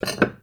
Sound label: Vase Medium Impact